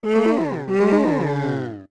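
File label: Gibdo Chase Moan; Pamela's Gibdo-Dad moaning while chasing Link (3 pitches)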